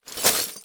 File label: Broken Glass Plastic Tub Rattles Single; Foley